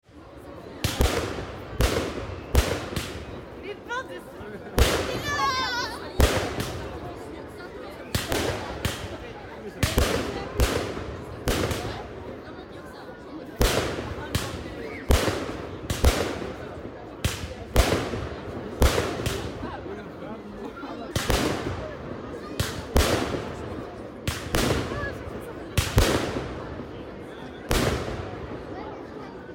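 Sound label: festive fireworks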